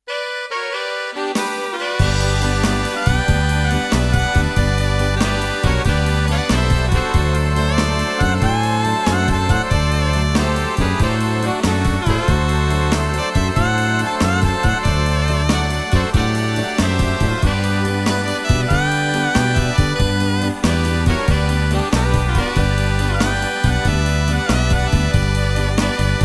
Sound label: instrumental